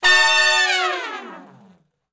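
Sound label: Long Falloff (B) harmony, Horncraft; Sections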